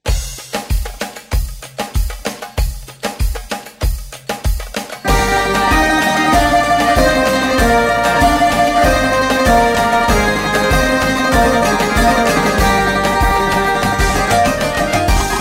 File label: Greek Bouzouki Rouba